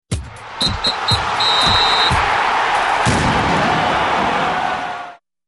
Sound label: Football Alerttone